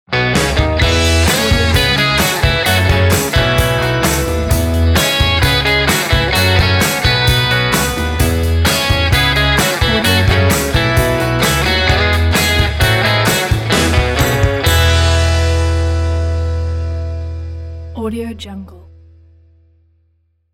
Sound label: British invasion ident